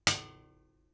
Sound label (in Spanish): Baqueta ancho, beats with different tools and materials